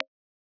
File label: violin symmetrical IR post-processed by SRFO